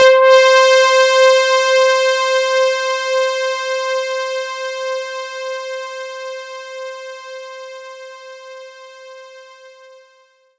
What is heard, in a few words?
C5, Synth Line; 90 s Sample CDs; NiceBeats; SynthLine VOL-31